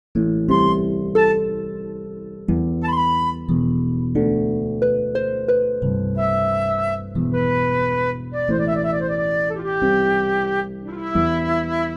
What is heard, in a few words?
sinfonietta for flute and harp